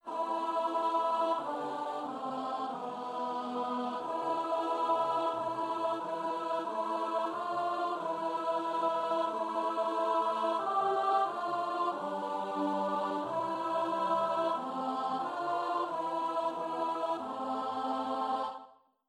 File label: Psalm alto